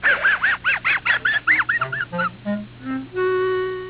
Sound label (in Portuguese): risada